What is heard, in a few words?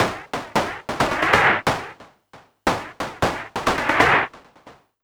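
SCUM snare drum; Big Fish Audio; Uncivilised G'rooves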